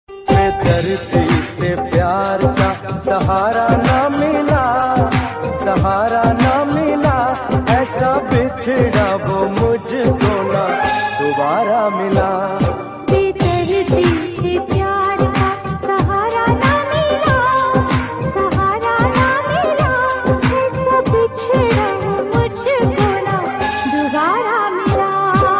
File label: Old Hindi Song Ringtone